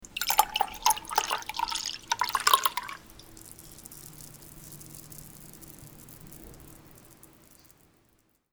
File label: wine